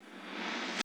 REVERSE1; Crypton - DrumBase5000; R70 KIT #2

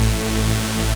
saw lead synth; Ueberschall; Techno Trance Essentials

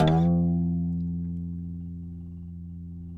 OUD F 2; Spectrasonics; Hans Zimmer Guitars VOL-2

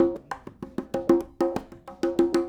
Congas, 70s Analog Funk

